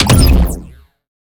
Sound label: Shot Medium Laser Photon Pulsar